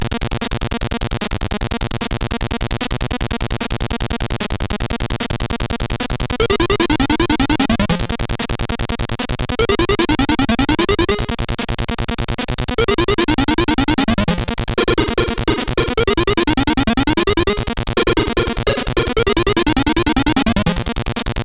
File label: sid CSG8580R5